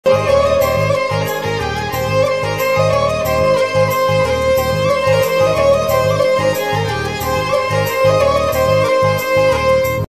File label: ringtone hindi ringtone; aslamstar